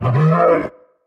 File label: monster roar long; soundscrate